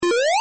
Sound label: jump